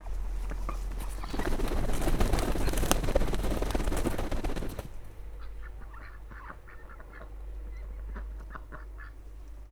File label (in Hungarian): felrepülő galamb kacsa; állathangok; paraszt udvar; galambok